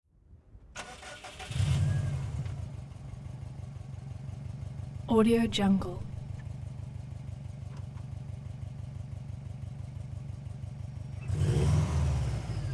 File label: turbo racing car start idle revs and away; hollywoodedge